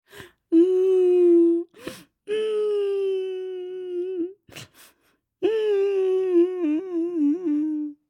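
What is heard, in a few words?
zvuki placha, crying sounds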